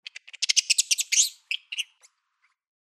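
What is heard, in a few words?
Varecia rubra cackle, Lemur; Varecia variegata rubra; The Audible Phylogeny of Lemurs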